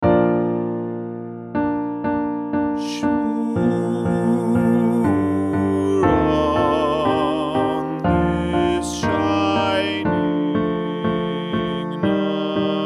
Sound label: Bass